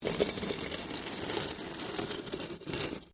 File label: Stone On Stone Small Scrape; Material components for constructing more complex sounds; Scrapes